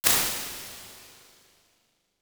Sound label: vocal duo; IR